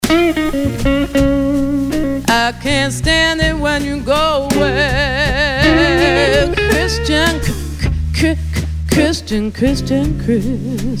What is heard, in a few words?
blues